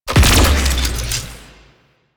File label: Shot Heavy; Weapons; Warframe; Archwing; Larkspur Prime